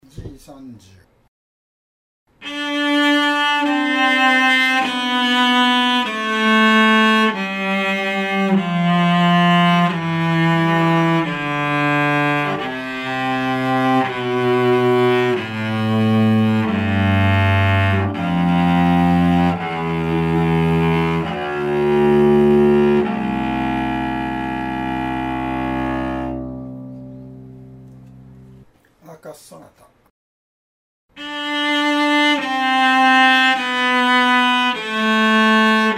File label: arcus sonata carbow, bow mechanics